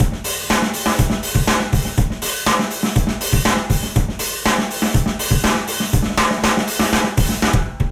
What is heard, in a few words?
ALT ROCK DRUMS